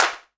clap sample f key; percussion; single hits one shots

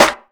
airy sizzle snare one shot g key

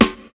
damped snare one shot a sharp key

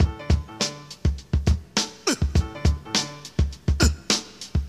102 bpm high quality breakbeat d key

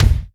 rich top end kick drum F sharp key; kick drum samples

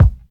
smooth kick sample a sharp key; kick drum samples

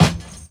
acoustic snare e key

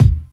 short kick e key; kick drum samples; drum single hits one shots

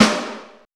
subby steel snare drum a key; snare-drum-samples

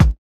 raw bass drum single hit a key; kick

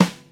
warm sounding rock acoustic snare f key

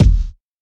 tight low end bass drum sample F key; kick drum samples

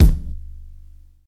rich mids steel kick drum g key; kick drum samples

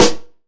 snappy snare drum sample d sharp key

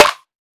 tight lows snare one shot D key; snare drum samples

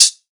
short hat sound c sharp key; hits one shots; hat samples; Duration 0:01; Samplerate 44100 Hz

